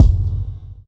long tail reverb kick drum sound c key

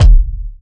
perfect bass drum sample d key; kick

